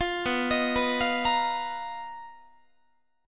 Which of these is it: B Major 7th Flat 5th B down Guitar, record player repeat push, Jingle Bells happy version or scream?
B Major 7th Flat 5th B down Guitar